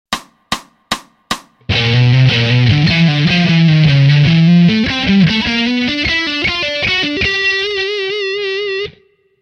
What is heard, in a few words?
Mixolydian Mayhem Lick